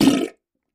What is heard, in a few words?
Bowl Fart; farts